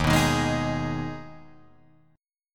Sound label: D sharp Suspended 4th Sharp 5th D sharp, Guitar Drop D; chord shape